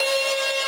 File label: synth main